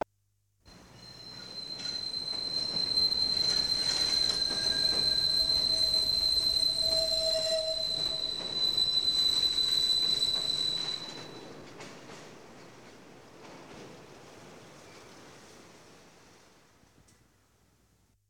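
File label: boarding a train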